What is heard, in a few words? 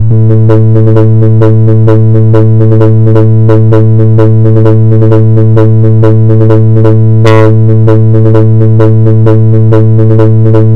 rhythm